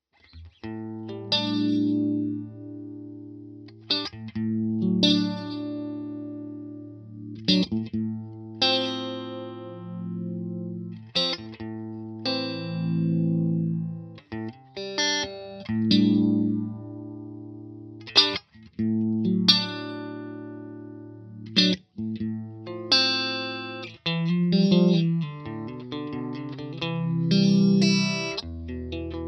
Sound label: Phase Full